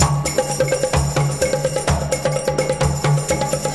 Latin House Loop 128 BPM; Vengeance Samples

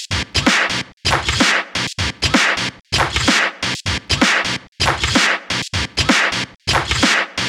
Minimal Loops

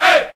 Chant

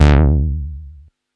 Bass Juno D; Vengeance Samples

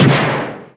Percussion; Vengeance Samples; VEH2

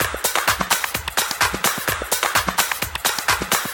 Percussive Loops 128BPM; Vengeance Samples

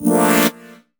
Synth Shot; Vengeance Samples

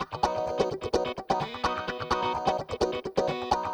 Electric Guitar - B maj, 128BPM; Vengeance Samples; VEH3 Electric Guitar Kit 2

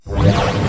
Sweeps Short

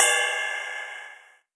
Ride, Cymbals; Vengeance Samples

